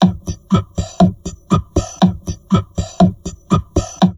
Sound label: TALK, Drum Loops Crazy Processed